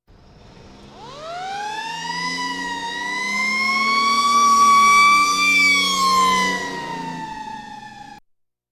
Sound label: Police, Fire engines